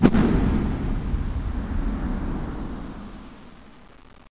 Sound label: bomb; teracide